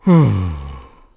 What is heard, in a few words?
Sigh; Mayor Bo sighing (after a goat escapes)